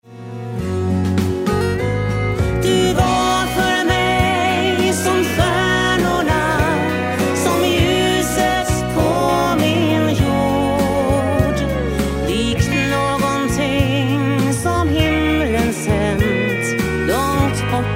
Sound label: country parlor